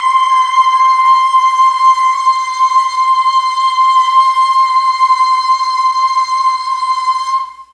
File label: C4 BOYS CHOIR, Mellotron; Mike Pinder - Mellotron Archive CD